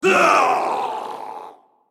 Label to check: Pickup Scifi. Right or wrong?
Wrong